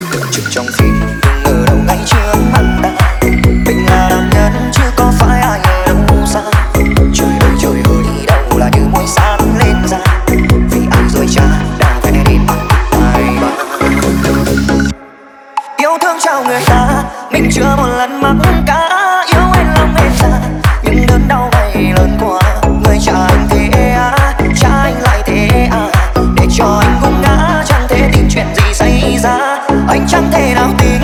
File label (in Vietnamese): Nhạc Chuông